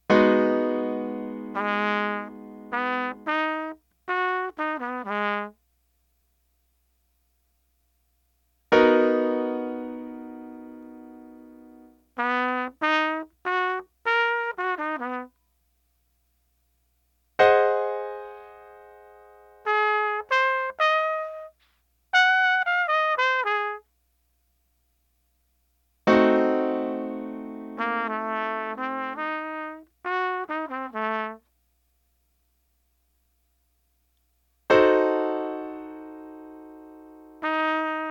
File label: ear training chords harmonic